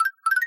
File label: Yoshi Egg Shell Break; Wii